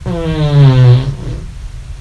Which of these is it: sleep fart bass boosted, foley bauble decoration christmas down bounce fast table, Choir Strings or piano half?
sleep fart bass boosted